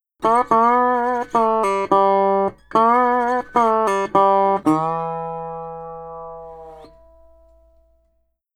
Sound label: Country Dobro, Intro Alt; Digidesign; Pro Tools Essential Loops